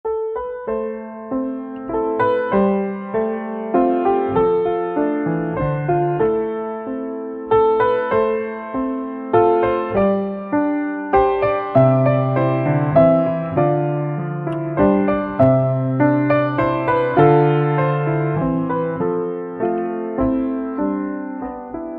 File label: Music Box